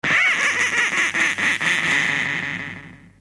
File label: squeeky; radioduck